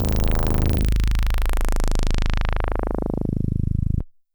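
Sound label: DX100 G#0; rocket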